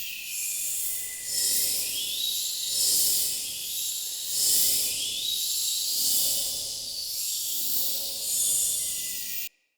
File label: Ghost Plant Loop, Ambience; Fey Hub